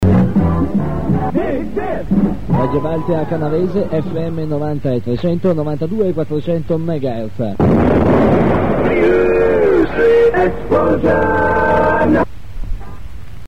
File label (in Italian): stacco